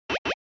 Retro Anime System High

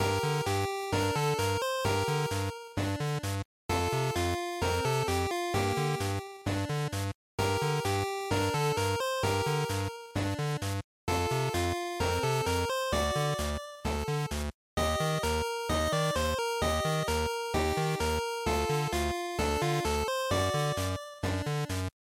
GB RPG Field Loop130

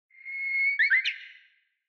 Bush Warbler Synthetic

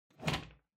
Pendulum Clock Hardware Hook Remove

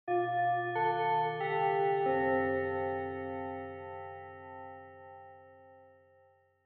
Japanese School Bell Far Fast Short

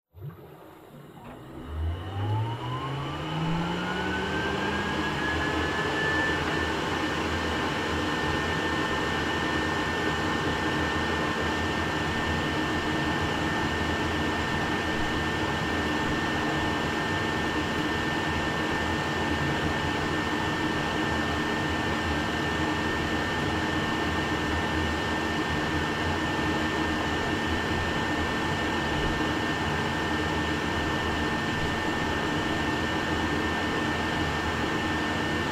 Washer Front Load Noise Dry

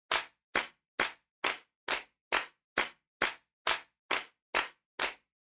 Handclap Fast

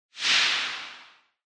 Noise Sweep Low Short

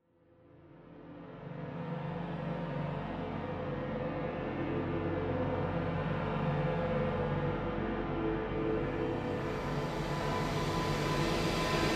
Horror Accent Rise, Long

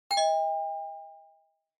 Quiz Button Fast

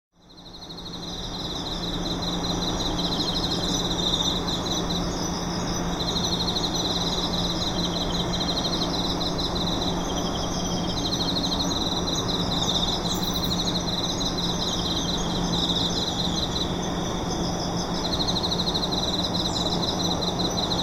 Chirping Insect Real Ambi

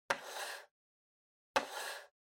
PC Mouse Move Wood Hit

